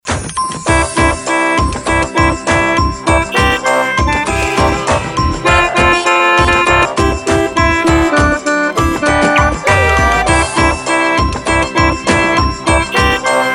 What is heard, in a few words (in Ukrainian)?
Джингл Автомобільний